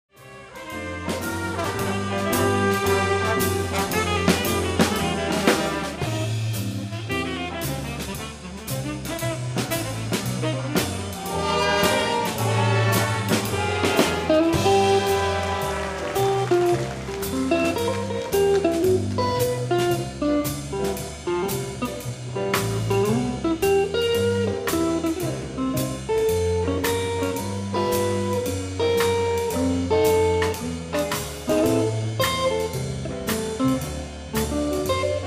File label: Basically Blues guiter solo